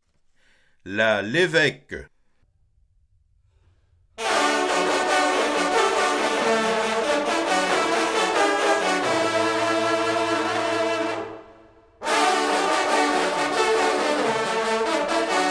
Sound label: fanfare; bien alle nantes